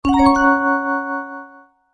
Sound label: Best I Phone Alert Tone